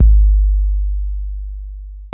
K 808, KICKS TR 9+8

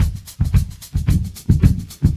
LOOP, 70's Breakbeats; USB Soundscan vol.46; AKAI

